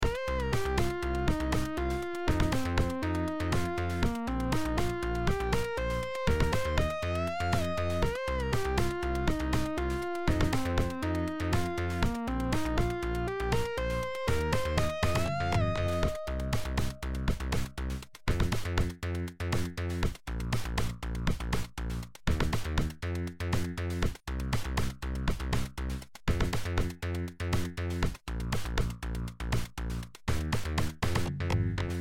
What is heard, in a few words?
bass basstone lead sound beat